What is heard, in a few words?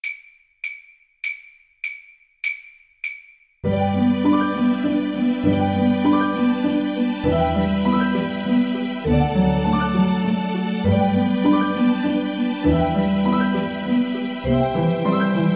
arirang kar, Flute